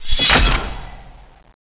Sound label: skill loop end; tfa cso; budget slayer